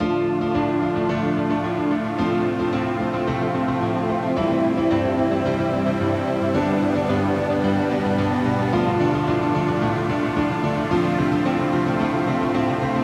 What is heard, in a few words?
menu shining star opener; Spacecraft Tactics